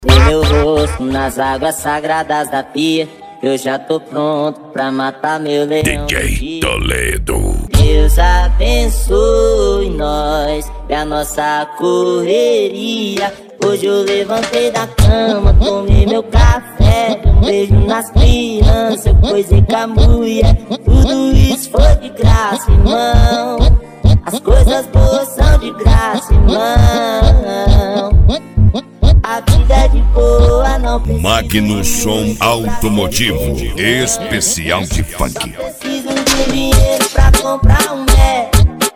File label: funk